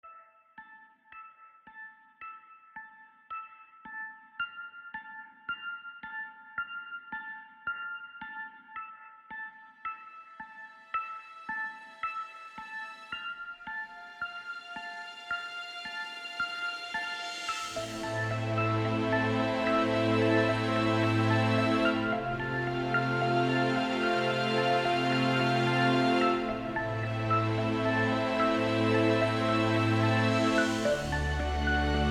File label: Celestial Riser Extended No Clock